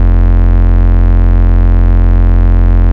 Root F, Bass

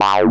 303 Acid G; Vengeance Samples

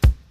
Kick, Trap

